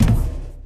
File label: plastic land; Golden Crayon Sounds